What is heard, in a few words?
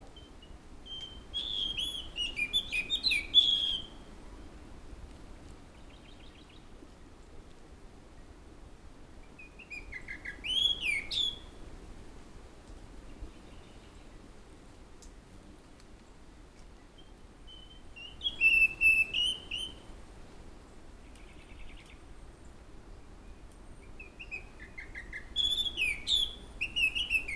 thrush white throated rock Monticola gularis